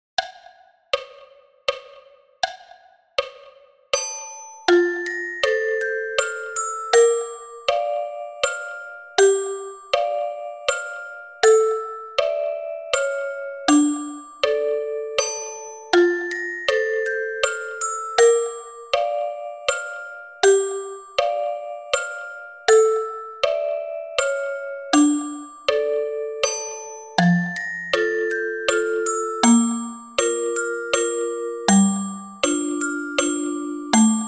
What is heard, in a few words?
Serenade Dvorak 80 bpm